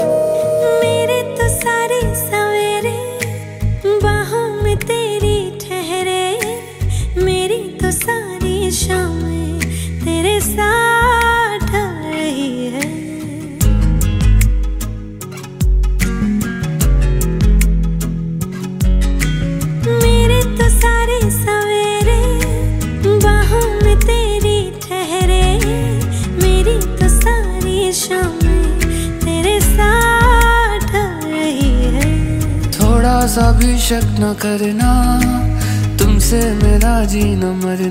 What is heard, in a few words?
Hindi Song Ringtone